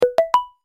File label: Whisper, notification; Huawei; Ascend P6